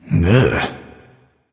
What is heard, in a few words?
"ugh"; smite; voicepack; ullr